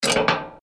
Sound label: machine burst